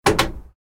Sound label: telephone buttons